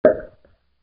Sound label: pop tube